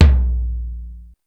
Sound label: Floor Tom, EMU Proteus